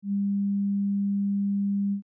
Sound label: oscill